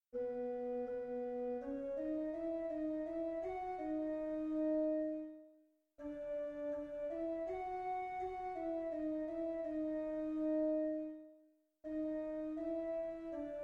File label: alto alone, choir; bach 148 wo soll ich; wo soll ich fliehen hin auf meinen lieben gott bwv 148